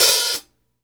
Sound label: CLOSE, HI-HATS; Sampleheads; New York City Drumworks VOL-1; SP HI-HATS